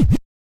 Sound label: Hip-Hop Kit Scratch; Roland Juno-D